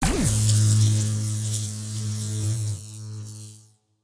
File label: Laser beam; super mombo quest